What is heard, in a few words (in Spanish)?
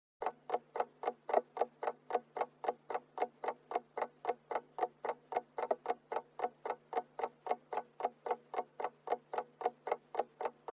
reloj tic tac efecto de sonido